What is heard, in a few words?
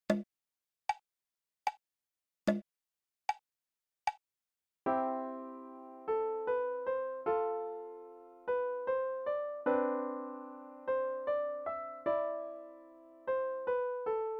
Gurlitt Music Box Slo